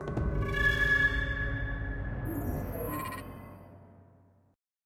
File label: Music Gameplay Player Death Stinger